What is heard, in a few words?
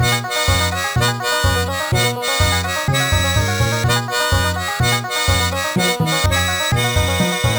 mod banjo